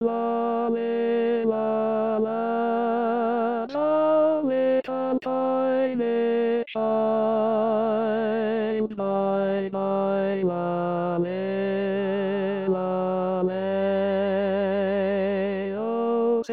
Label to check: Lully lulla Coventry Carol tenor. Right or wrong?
Right